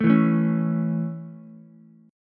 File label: A Major, Ukulele; strummed